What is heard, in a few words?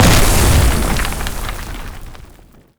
erupt, antlion dig; creatures